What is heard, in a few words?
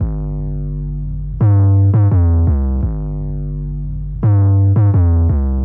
Hammer Bass, Drum & Bass; Fluid Dynamics